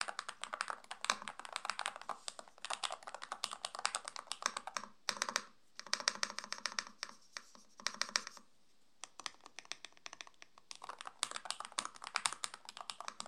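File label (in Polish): Dźwięk Epomaker G84 HE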